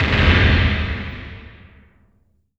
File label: LOOSE, DIST BDRUMS; Zero G; Malice In Wonderland